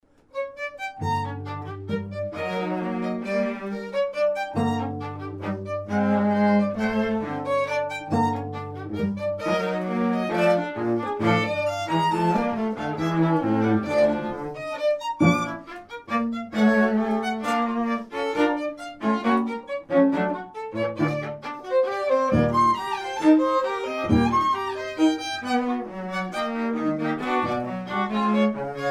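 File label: string trio; sokolov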